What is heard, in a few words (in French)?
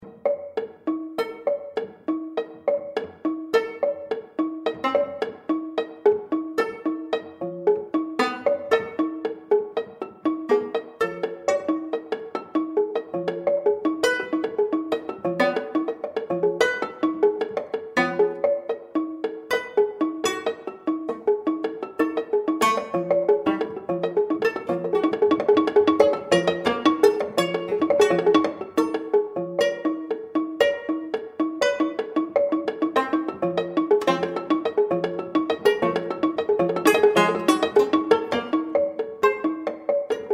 ETUDE POUR PIANO PREPARE